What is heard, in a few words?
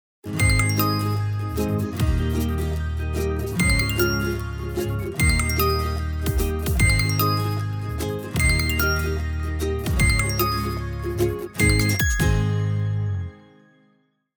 Cheerful, ringtones; Tinno; ATT-Radiant-Max